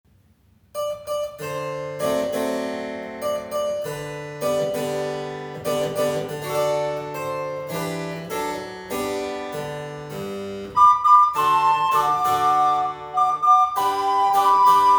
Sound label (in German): Fipple Song mit Klavierbegleitung